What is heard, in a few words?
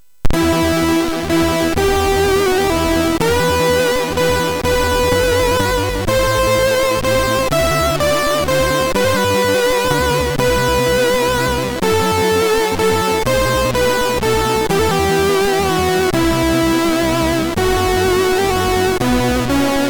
Swedish folksong, sid MOS6581R3